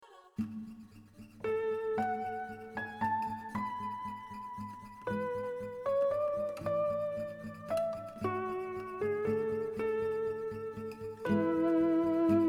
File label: Slow Waltz